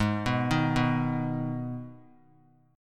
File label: Ab Minor Ab down; Abm arpeggiated; JGuitar